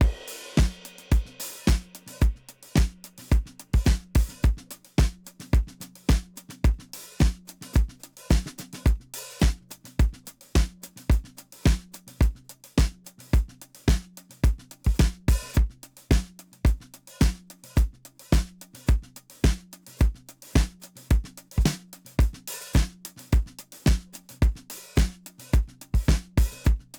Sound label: 70 s Vibe 108 bpm, drums; Basement drums vol 1